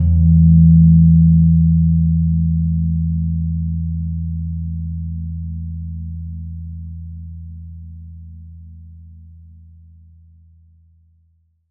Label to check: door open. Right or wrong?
Wrong